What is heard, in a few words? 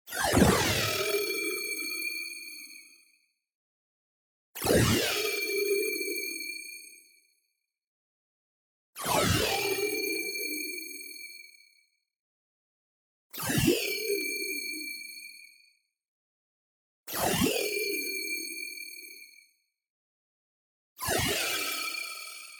tonal, resonant feedback; boom library; Anime Essentials